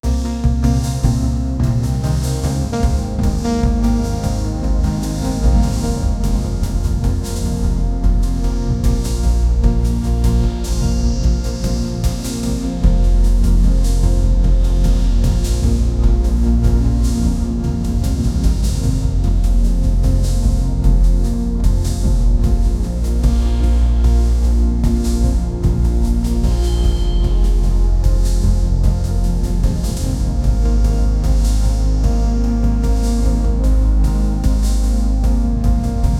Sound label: a lobby, chill